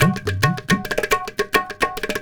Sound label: India, Heart of Asia